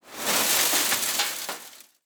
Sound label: small debris